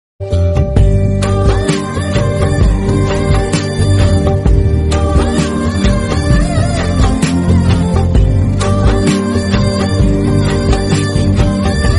love emotional bgm ringtone heart touching bgm south bgm